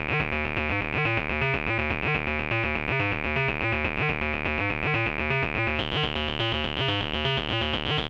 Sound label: RAVE 123, SYNTH SEQ